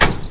lever; Game Maker 8

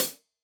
Hihat Closed; Ableton Live 12 Lite; Core Library; Drums

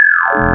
Harmonics Spectral; Ableton Live 12 Lite; Vector; Sprites

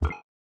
low Battery; XSOverlay

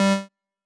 saw error; Logi; Loupedeck Sound Pack

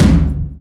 Tom 80s Space Craft; Drums

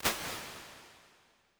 Halls Rich Verb L, impulse responses; Ableton Live 12 Lite

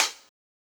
Hihat Closed Acoustic Room

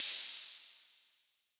Hihat Open

